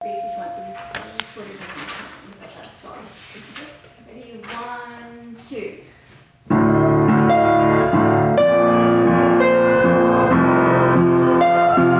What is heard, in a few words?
Sopranos from G with bass accompaniment; choir practice